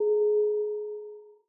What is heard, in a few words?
bell; dreambuilder modpack